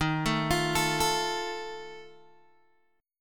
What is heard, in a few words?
Eb Suspended 2nd Flat 5th, Guitar Open D